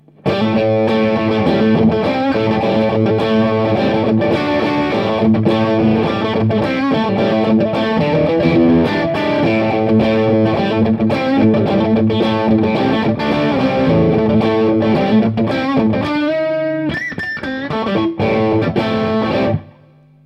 truevintage satu 70s, guitare

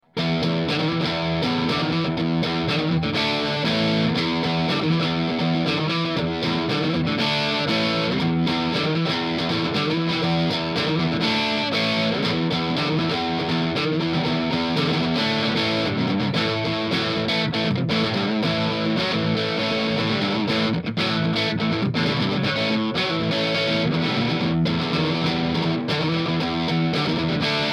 truebucker crunch milieu, guitare; Van Zandt Truebucker; Test micro Van Zandt Truebucker

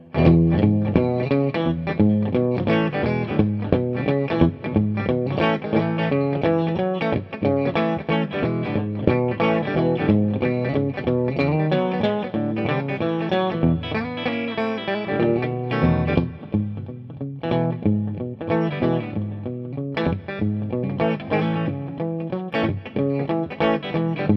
true vintage boogie, guitare

